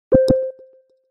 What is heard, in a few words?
click whoop sms tone; iphone